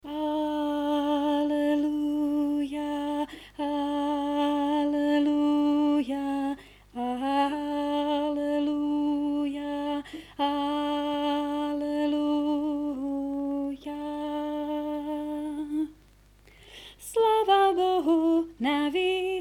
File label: Alt, spevokol; Vianoce 2019 - Prišiel Ježiš